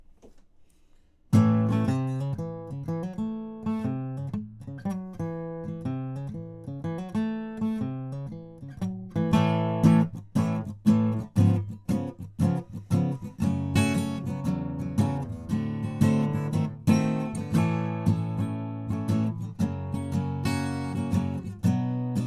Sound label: Strumming Microphone